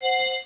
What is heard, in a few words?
pkup